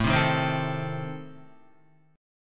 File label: A Diminished A down Guitar; Adim strummed